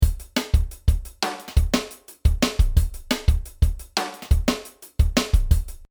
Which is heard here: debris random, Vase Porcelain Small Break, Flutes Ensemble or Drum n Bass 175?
Drum n Bass 175